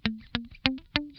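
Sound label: damped SCALE C1, Funk Guitar; 90 s Sample CDs; Zero G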